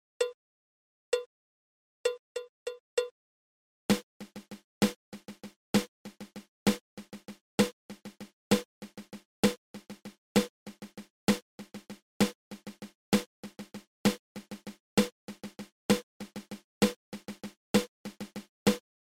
Single Drag Tap triple

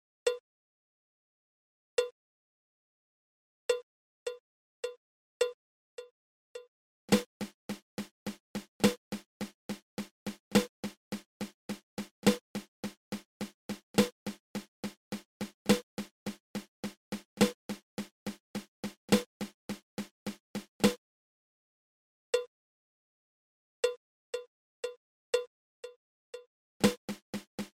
Flam Paradiddle diddle

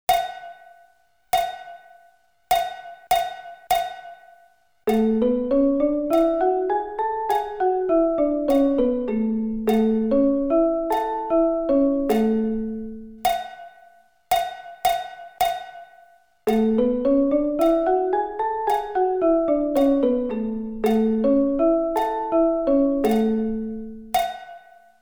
A Scale

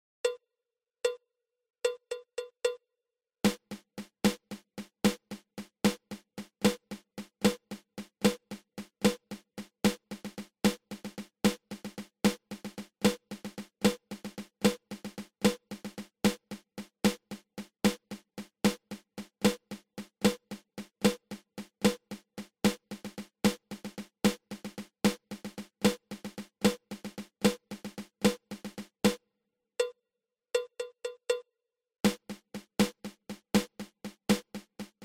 Flam Drag

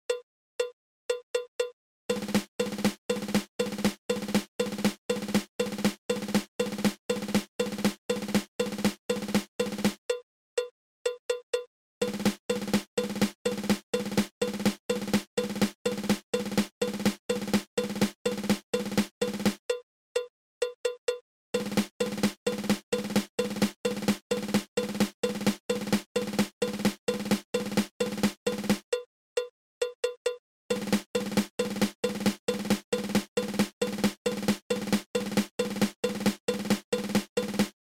Five Stroke Roll

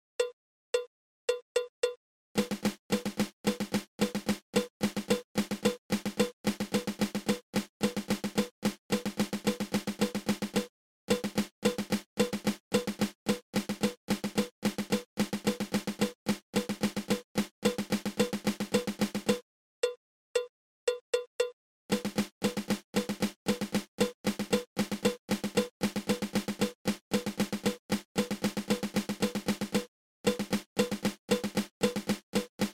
Flam Tap

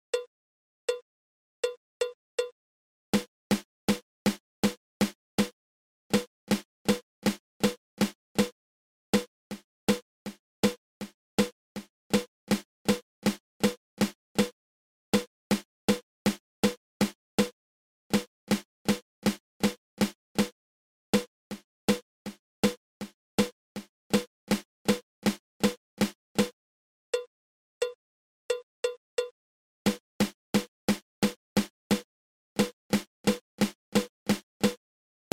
Flam

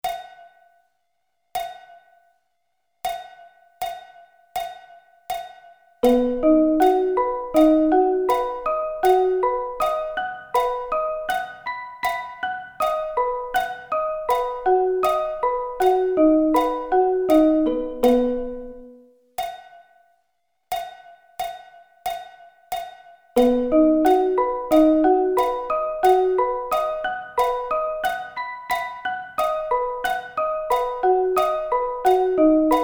B Arpeggio Inversions

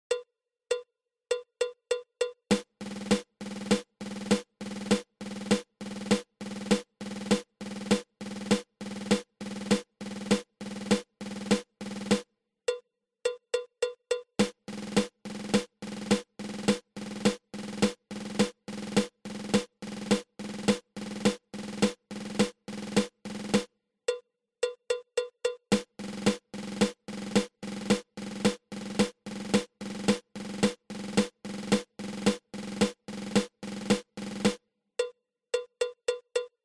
Seven Stroke Roll Triple

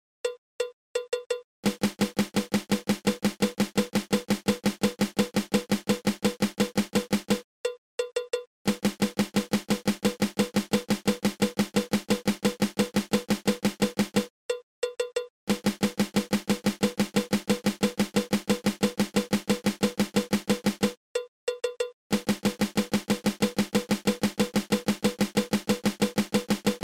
Flam

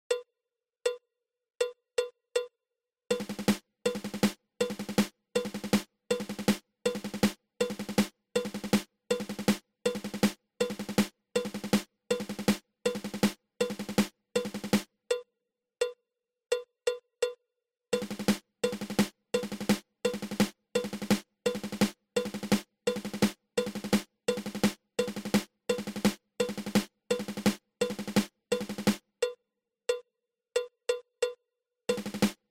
Five Stroke Roll

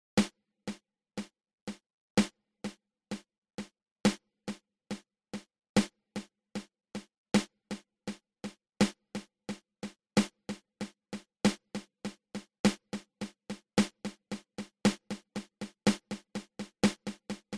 Single Paradiddle open close open

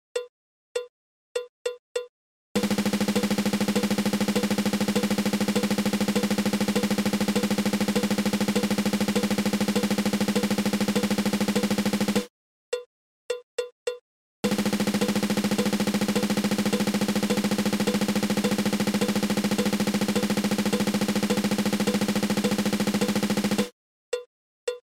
Double Stroke Roll

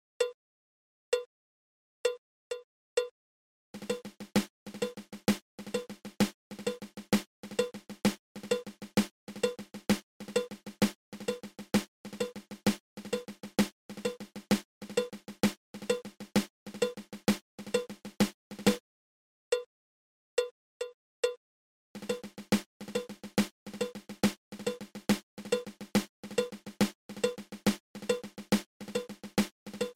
Single Ratamacue

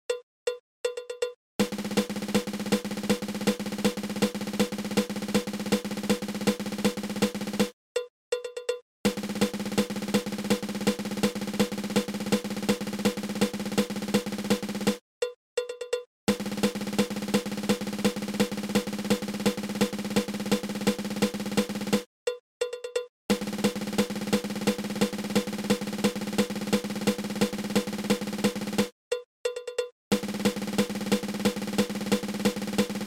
Five Stroke Roll Triple

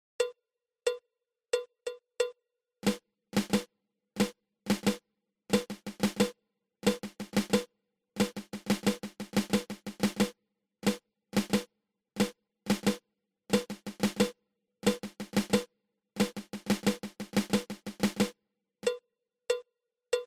Patafla fla

